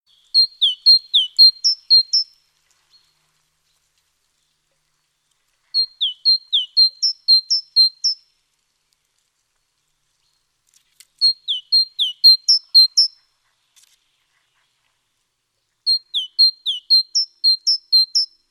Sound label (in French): mesange charbonniere